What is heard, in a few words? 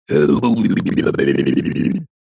cartoon voice grumpy head shake or lip wobble